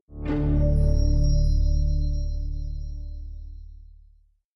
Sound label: homepod ready to set up